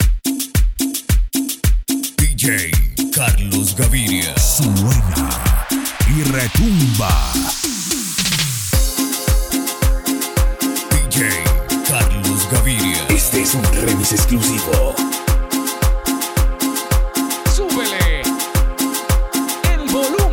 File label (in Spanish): cumbia